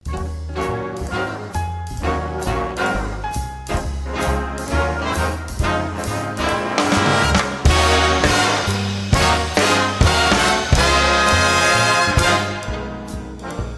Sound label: Bigband